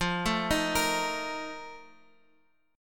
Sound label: A sharp Major 7th Suspended 4th